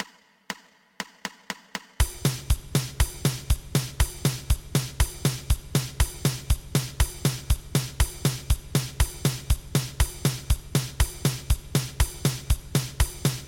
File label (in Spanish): 2 pulsos